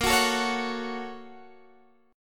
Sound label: Bb Minor Major 7th Sharp 5th, Guitar, Drop D; x,13,16,14,14,14; strummed; chord shape